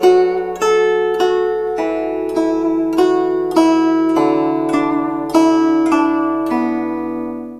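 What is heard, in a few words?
guzheng; Spectrasonics; Heart of Asia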